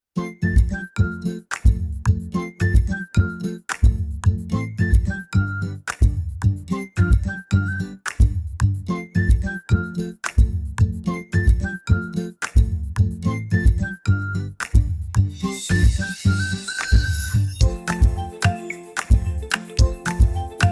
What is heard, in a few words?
dopamine ukulele